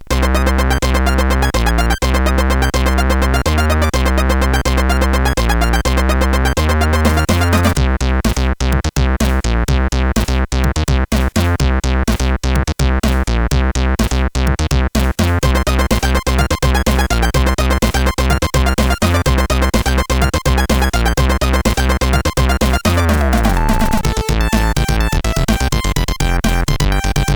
Oldies Remix, CSG 8580

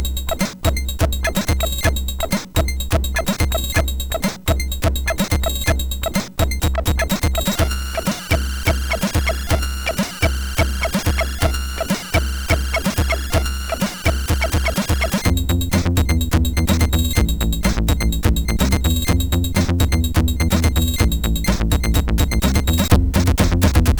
House Beat, MOS6581R4; hvsc; MC

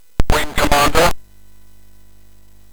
Jump Jet, sid MOS6581R4; hvsc

